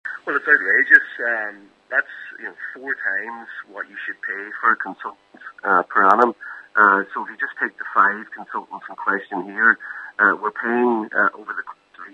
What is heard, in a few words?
pad